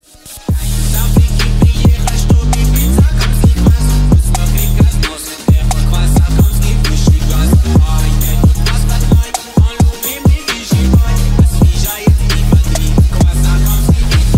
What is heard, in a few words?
phonk remix